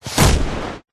explosions short small explosion no tail

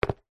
foley footstep single soccer boot on tiled floor

